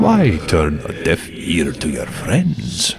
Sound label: vampyr; enemies; draugnorak